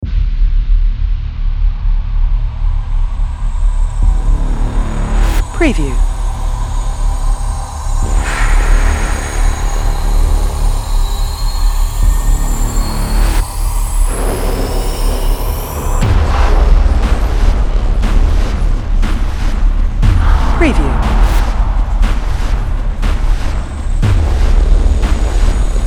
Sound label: Cinematic Dark Horror Scary Fear Buildup Rising Zombies Soundtrack